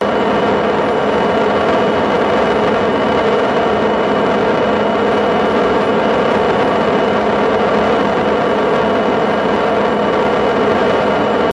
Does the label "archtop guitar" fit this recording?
No